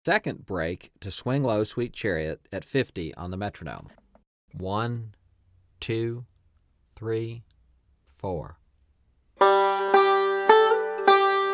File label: 50 Bpm; Swing Low Sweet Chariot Break 2